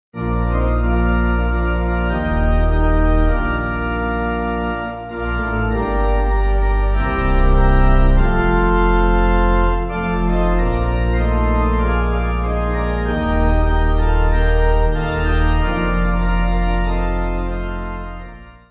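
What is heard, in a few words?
pipe organ hymn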